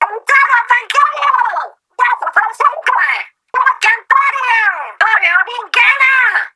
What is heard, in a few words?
Cheers; Grand Fest Tricolor; Ultra Signal Appeared